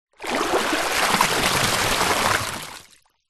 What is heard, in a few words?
nature water pour bucket into deep water; zapsplat